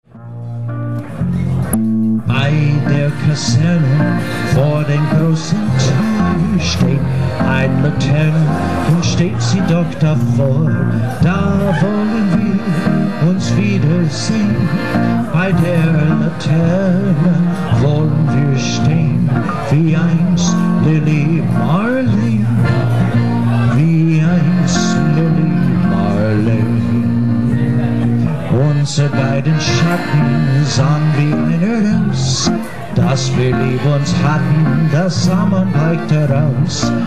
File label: German Band duo